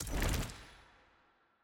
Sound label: map panel rewind